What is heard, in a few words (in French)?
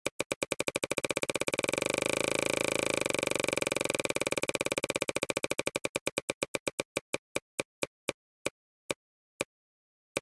roue